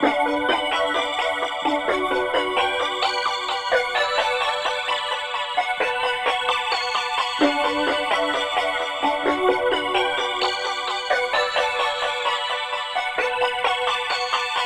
Keys Bm 130, Trap; HipHop; Melody Kits; Ghosthack; Flowers 130 Bm

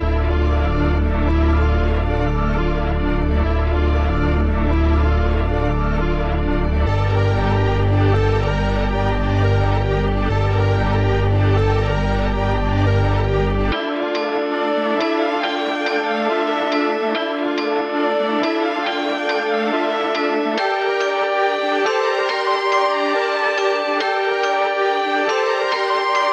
140 BPM D Min, Melody, Drill; Cymatics; 2022 Melody Collection

